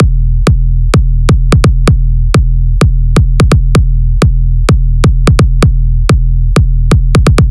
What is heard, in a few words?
Big Room Kick Loop E